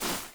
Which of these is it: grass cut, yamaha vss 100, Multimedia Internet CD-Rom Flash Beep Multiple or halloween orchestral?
grass cut